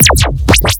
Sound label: Laser Gun